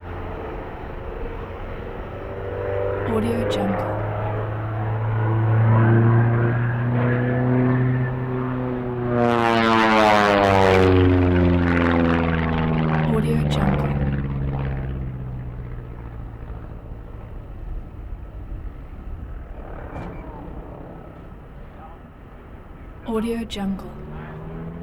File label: biplane buzz by fly bys multiple passes; prosoundfx